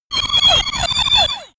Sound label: sci-fi laser shot burst